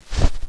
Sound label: gren throw; valve; common